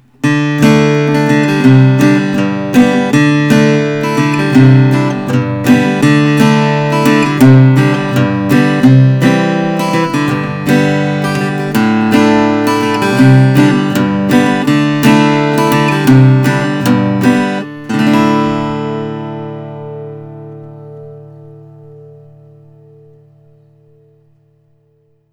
martin custom 0 12 vs, Guitars Acoustic; 0-sized VS; the Martin Custom Shop built a small batch of 0-sized VS guitars

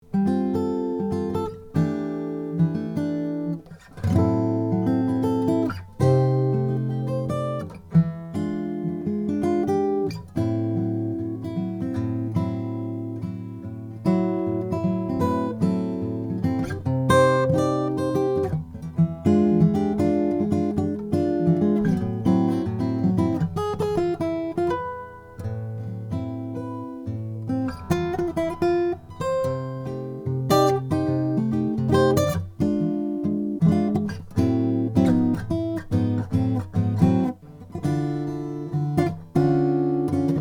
maingard d african blackwood, guitar

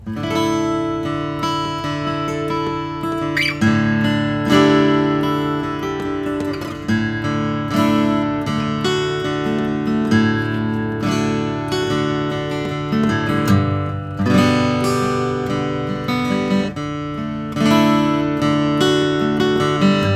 om2 g ss cutaway natural, guitars; collings

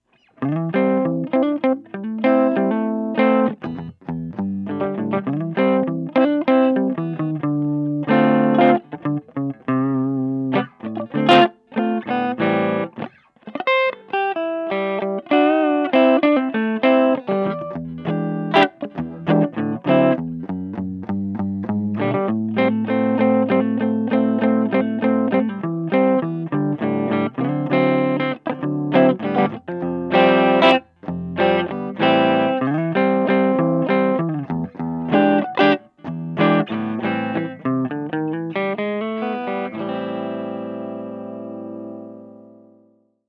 vintage wine burst, guitars; heritage h535

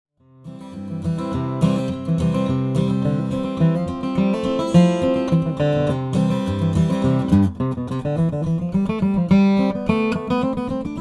lucas d brazilian rosewood

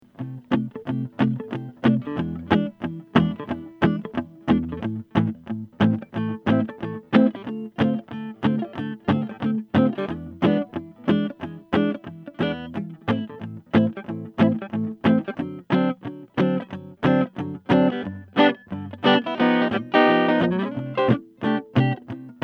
hofner jazzica custom blonde

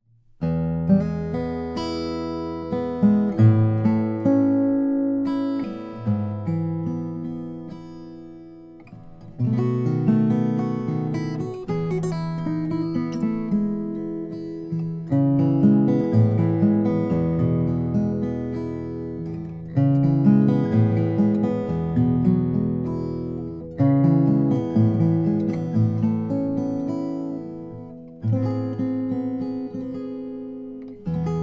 froggy bottom p12 cutaway 4a koa

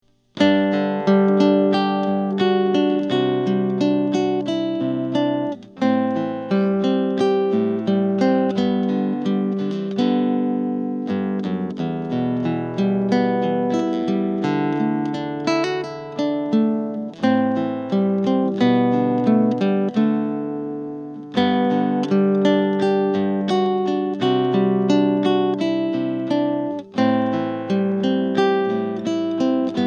kirk sand nylon string jazz cocobolo

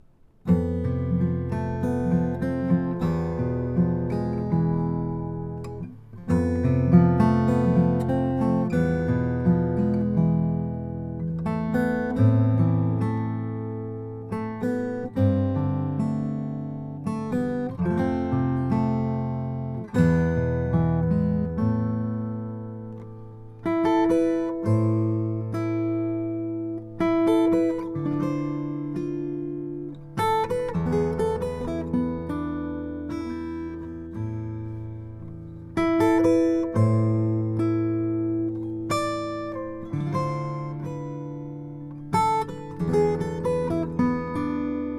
doerr legacy select indian rosewood

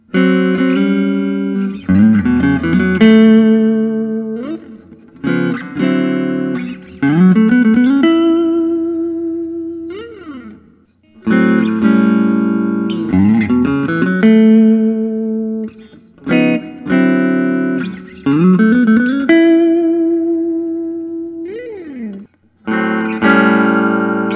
collings 290 single cut vintage white

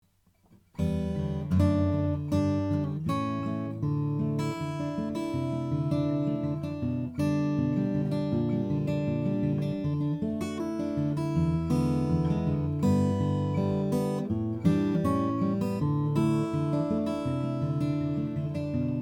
olson jumbo brazilian rosewood

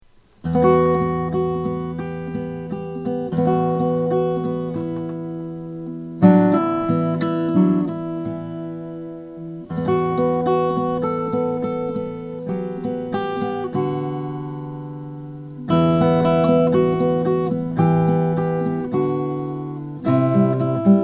collings 000 2ha custom adirondack top; 2008

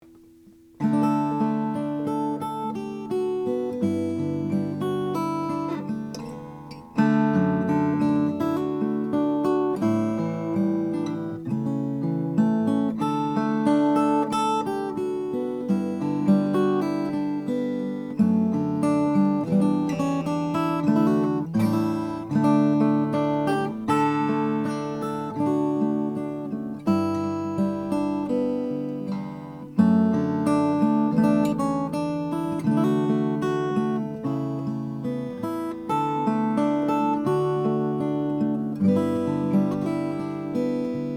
om cocobolo; josh house

